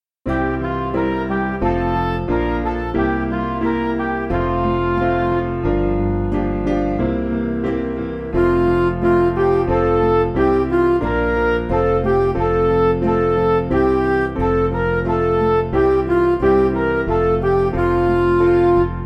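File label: Music Backing Files for Churches; Alla Trinita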